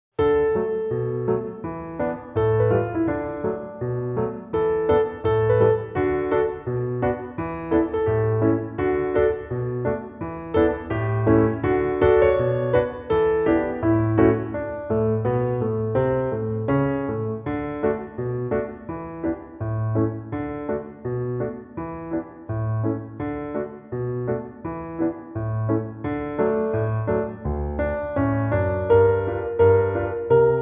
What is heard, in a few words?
Conkers piano accomp